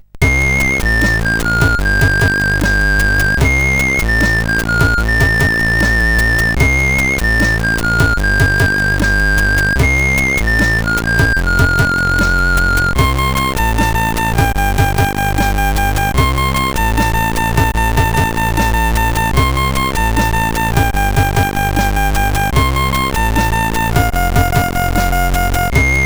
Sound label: sid CSG8580R5